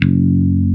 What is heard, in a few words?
ELECT. BASSES; OMI - Universe of Sounds